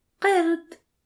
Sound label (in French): singe